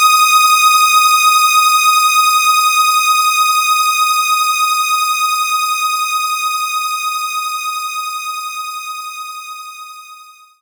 Saw Stack Wave; knights try

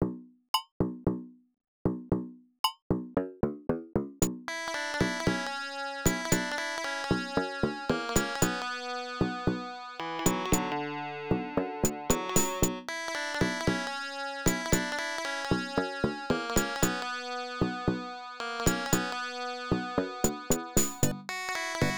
Cave; Emerald Dragon; pc-88

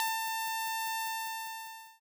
Beep Test

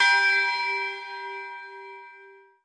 orbit tubebell; Sky Children of the Light gamerip

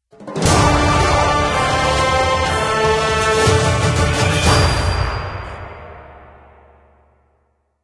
win jingle; android ios mobile gamerip 2015; dungeon legends

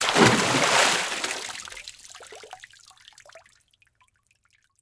water splash; a dump in the dark

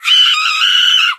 Scream Female

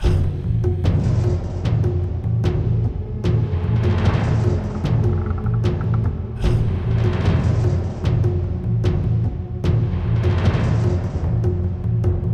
Norse Marching Large; Black & White 2

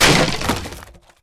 Wooden Fence Break

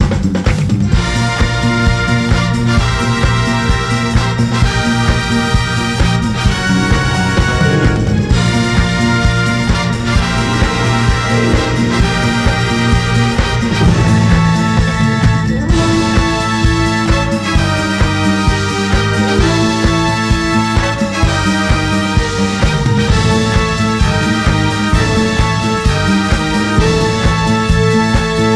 Sitar Orch

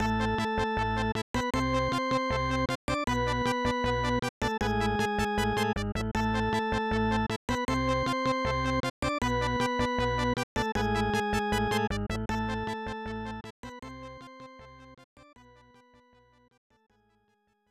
Defeat; Shigetaka Kashiwagi's Top Water Bassing; SNES

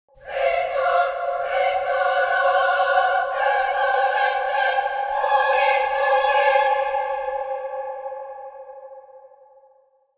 Minigame Sting

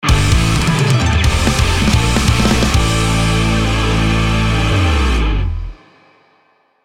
bgm win; Naruto Shippuden: Clash of Ninja Revolution III; Wii gamerip

